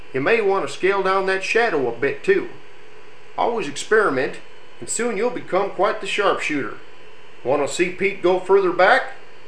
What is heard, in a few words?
Sound Scale